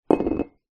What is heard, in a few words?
food soy sauce bottle down on wooden worktop; zapsplat